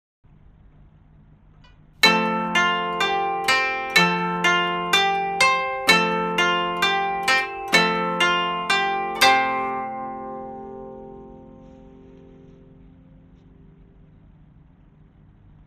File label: koto